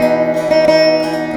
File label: VEENA; Spectrasonics; Heart of Asia